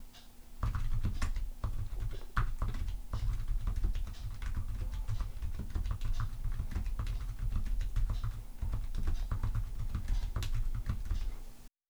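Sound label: audio keyboard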